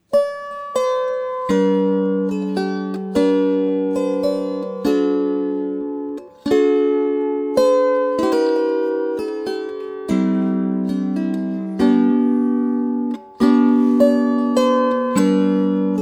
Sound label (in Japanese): 小型ソプラノ Kleine Sopran